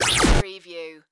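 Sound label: Production Element Sweeper; Sound Effects Bible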